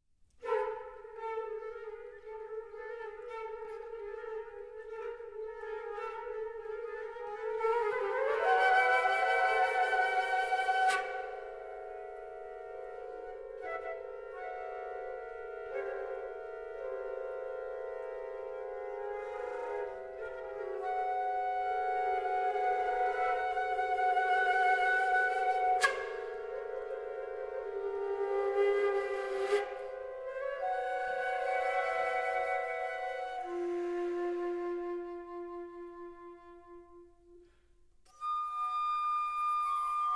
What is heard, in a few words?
concerto for flute